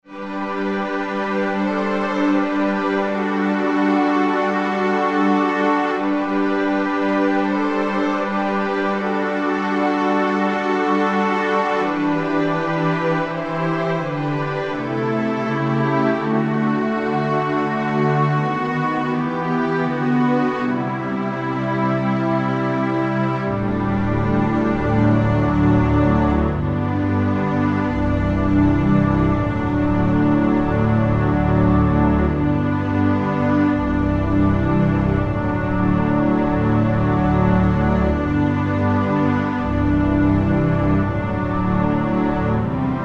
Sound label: Underscore PAD; Worship Flow